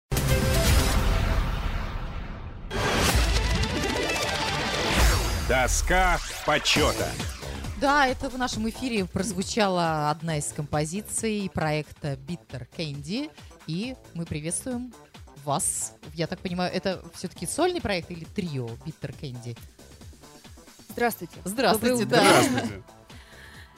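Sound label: Ukulele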